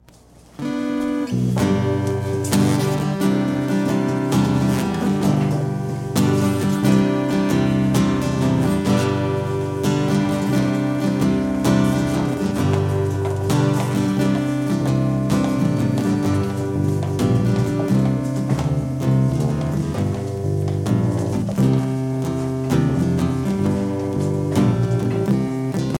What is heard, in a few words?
instrumental ringtone